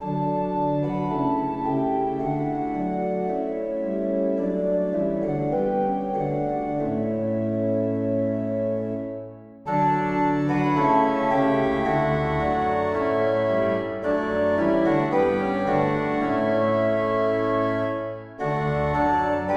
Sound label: Organ